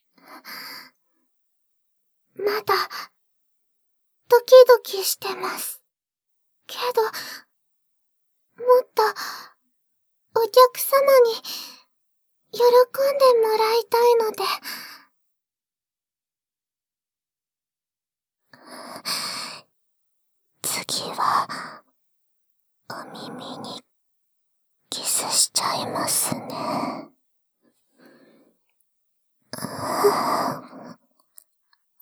耳舐め, ASMR; 効果音なし

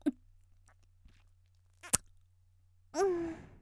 ゴックン後、口を離して「んっ」; 綾波系少女

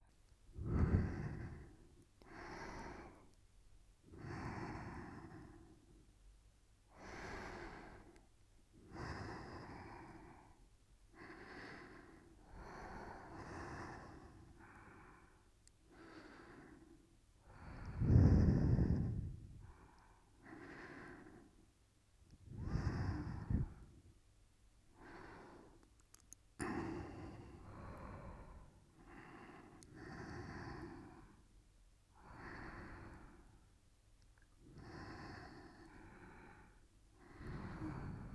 寝息; スキ×キス ご主人様が眠りに落ちるまで耳元で好き好きちゅっちゅしてくれるメイドさん

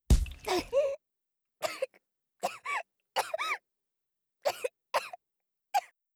吐血

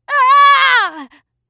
ダメージ うあぁあ…っ; 戦闘

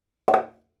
湯おけの音; 単発効果音

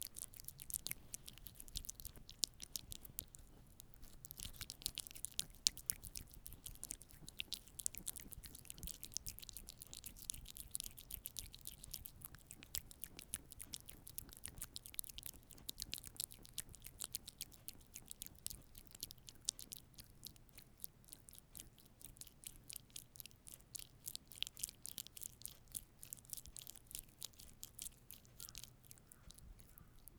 クチュ音, 効果音

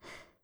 ブレスミディアム

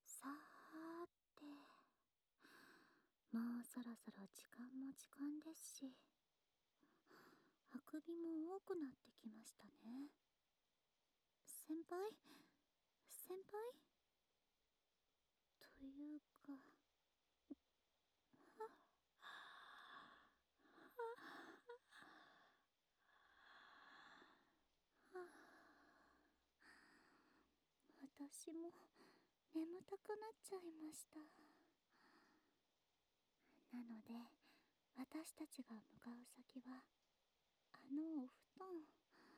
ゆったり安眠（胸トントン、子守歌）

